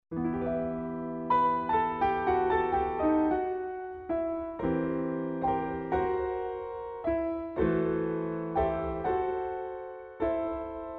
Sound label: Mireille Waltz; Gounod